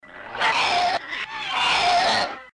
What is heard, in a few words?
Baby gappa roar